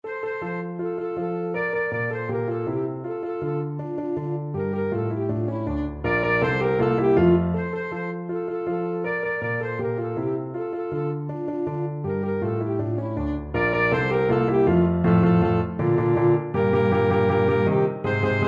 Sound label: saxophone